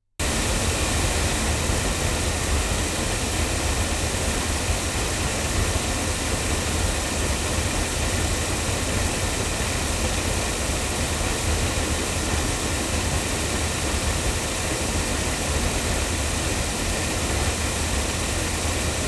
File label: loudspeakers; studio projects